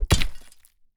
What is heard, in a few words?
sword attack